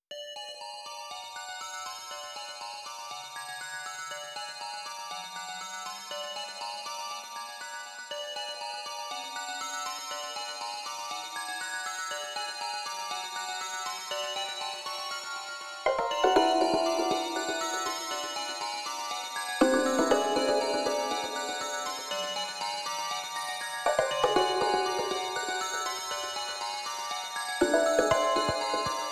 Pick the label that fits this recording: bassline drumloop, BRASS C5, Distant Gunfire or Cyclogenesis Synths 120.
Cyclogenesis Synths 120